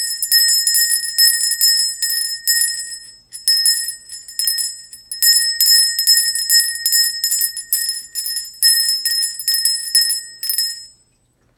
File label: red holly bell